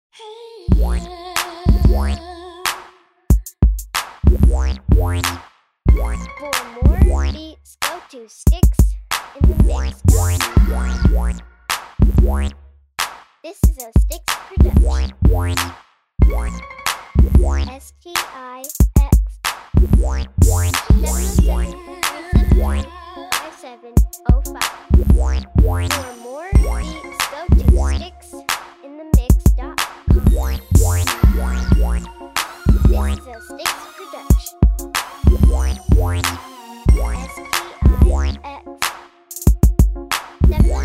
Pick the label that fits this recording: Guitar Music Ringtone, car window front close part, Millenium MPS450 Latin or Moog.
Moog